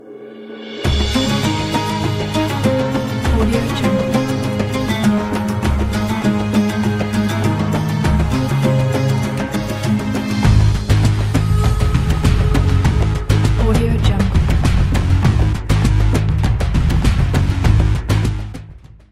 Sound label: ramzan lovely ringtone